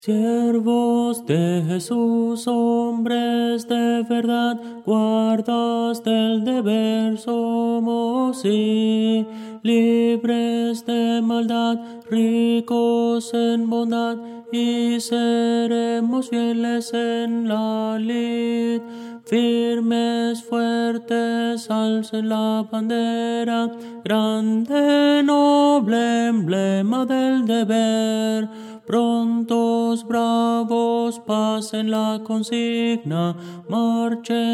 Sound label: tenor; Voces para coro